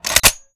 reload empty charge; gmod

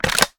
reload end fast load; gmod

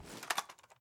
reload pull; gmod

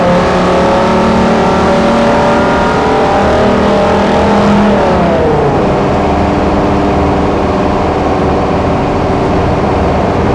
fourth cruise, vehicles; gmod; tdmcars; dodge ram

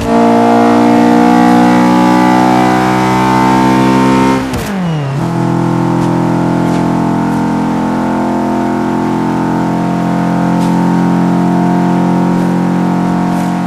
fourth cruise, vehicles; gmod; tdmcars; s2000

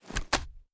reload end, sh; gmod; wfoly plr sh charlie725

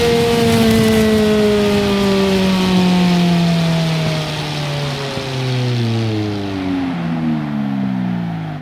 slowdown slow, ferrari 458; vehicles

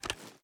reload fast drum tilt, reloads; gmod

